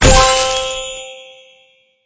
birthday cake; sfx

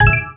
click correct

